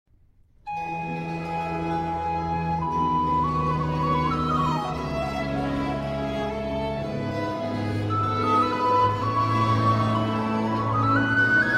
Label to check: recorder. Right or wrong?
Right